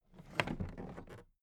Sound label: toolbox put down regular